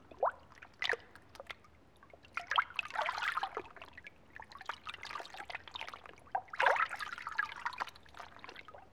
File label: Cave Waterdrop, ambience